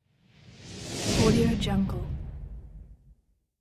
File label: whoosh; transitions and movement